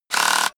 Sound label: screwdriver on load; maschinen; schrauben drehen hydraulik